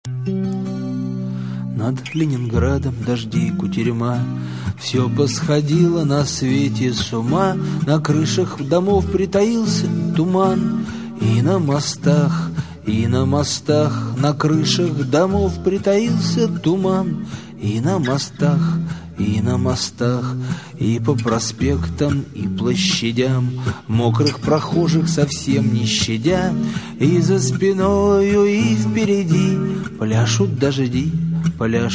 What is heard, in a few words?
waltz; Piter